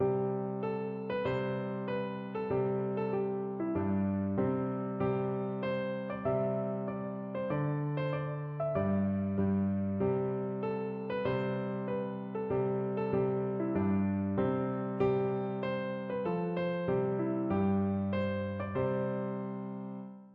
Hail to the Chief EASY, piano

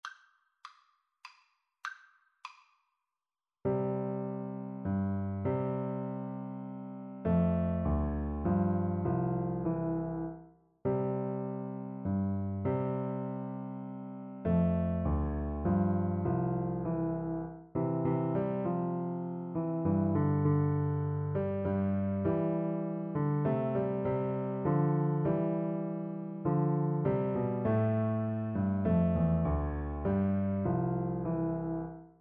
piano duet

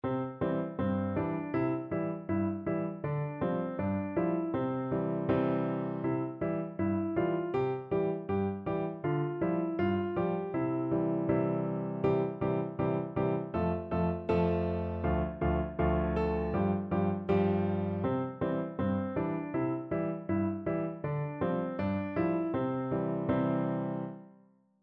piano duet

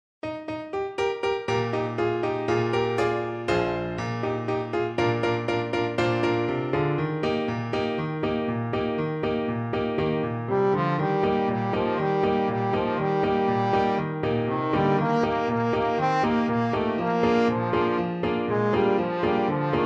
trombone

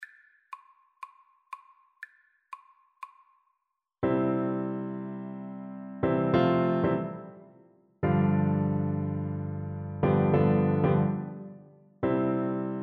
whole world, oboe

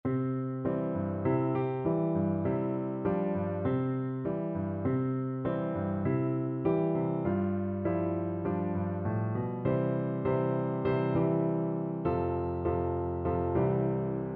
2 piano

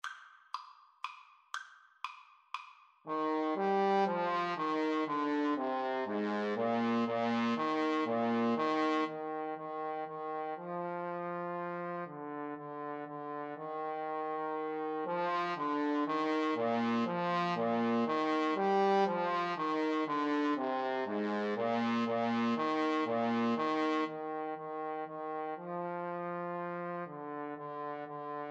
Menuett trumpet trombone duet

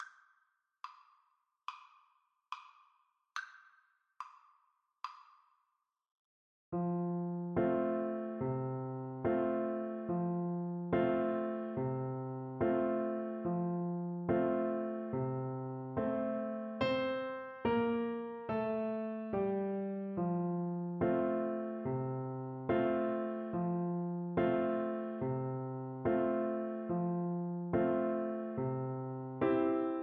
alabama, tuba

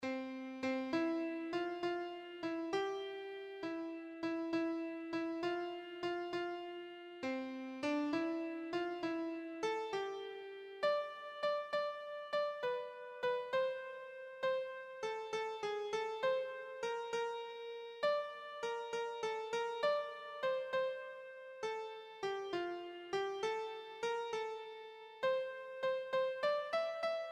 ring the bells, keyboard

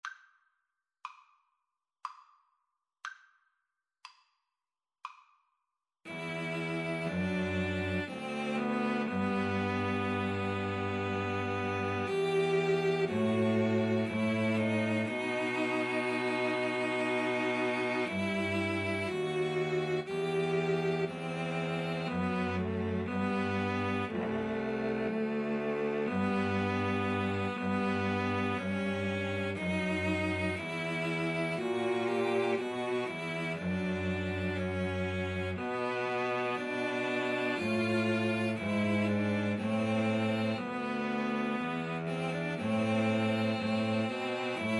Cello Trio

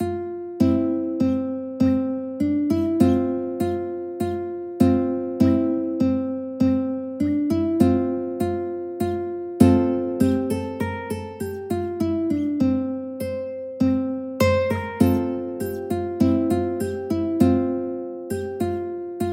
english dance, ukulele

